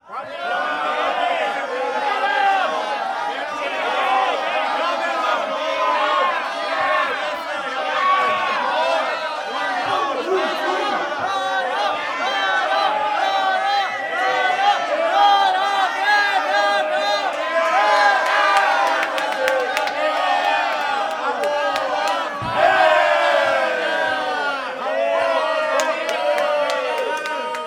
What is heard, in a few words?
Audience Box Fight Boxring Competition